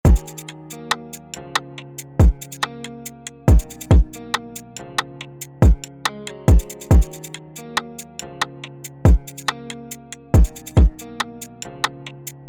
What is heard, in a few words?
tetrad guitars